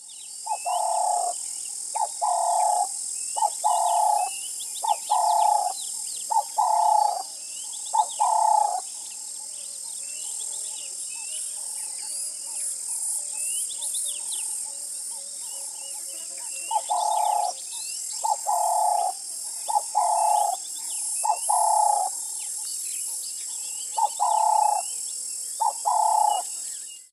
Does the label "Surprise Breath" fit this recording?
No